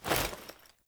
Foley Action Default Melee Heavy Swipe, Foley Default Clothing; Characters; Player